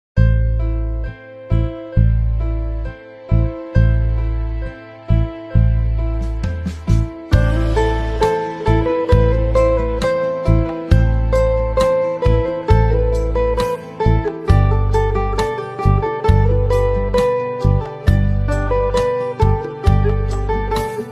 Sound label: Guitar